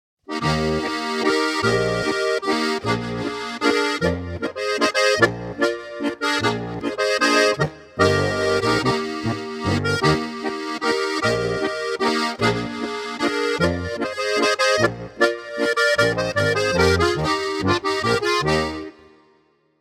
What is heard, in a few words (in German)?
2 Chörig Flach Ruhig B Es As Des